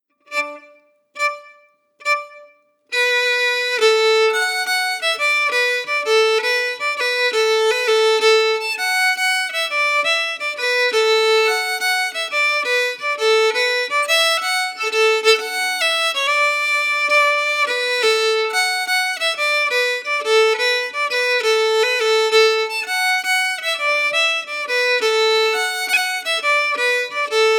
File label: melody slow